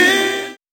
ouieee; Truth-Hz - 1-Hit KO Sample Pack

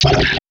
tschwoakch; Truth-Hz - 1-Hit KO Sample Pack

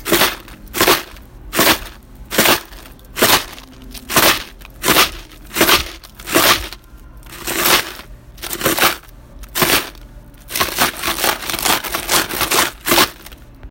Am Ice, Foley; TRUTH-HZ; Crowdsourced Sample Pack

